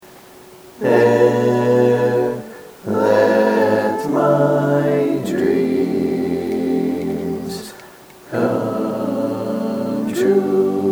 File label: Bass, barbershop tags